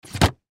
Camera Case Lid Open, Pelican Professional Hard Shell Plastic; Cameras; Technology; Blastwave